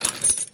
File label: metal Crate Open